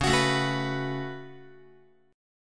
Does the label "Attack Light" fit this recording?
No